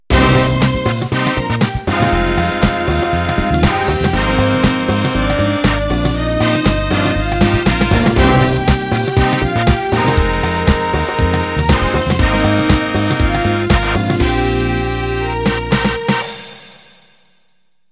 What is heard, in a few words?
House Garage Intro